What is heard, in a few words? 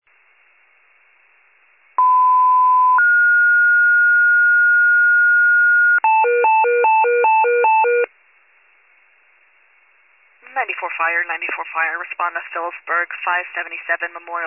FIRE pager alert